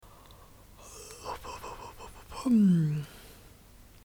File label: yawning